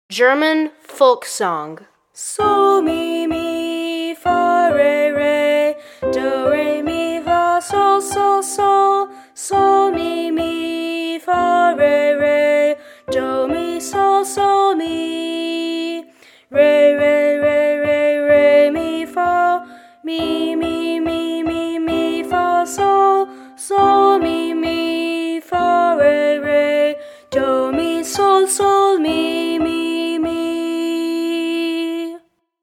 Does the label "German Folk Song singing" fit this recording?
Yes